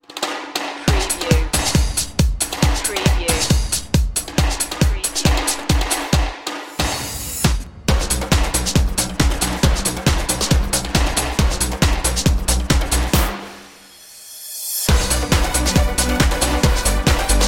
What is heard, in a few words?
samba party no crowd